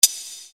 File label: Hat, EuroDance